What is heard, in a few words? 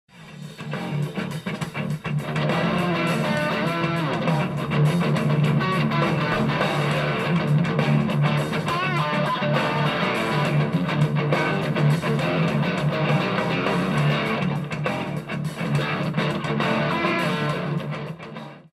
metal, guitar